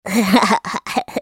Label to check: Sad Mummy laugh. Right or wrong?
Right